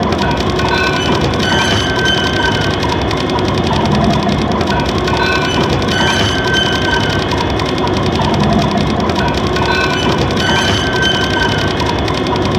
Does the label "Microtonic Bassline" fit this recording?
No